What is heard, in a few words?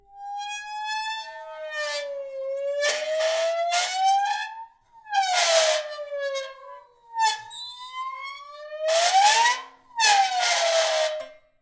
prepared piano vibrate